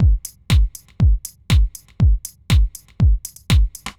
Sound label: crunchy 125